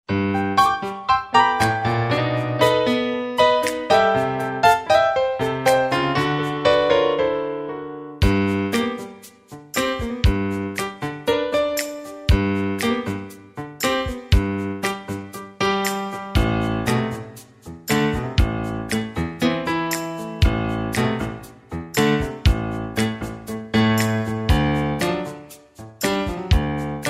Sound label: Romantic Dance